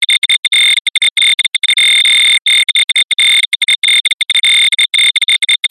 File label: science fiction code incoming beeps